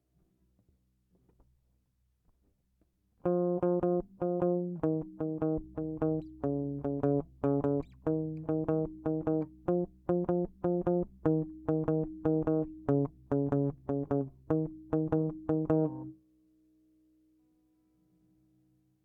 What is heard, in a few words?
Lead guitar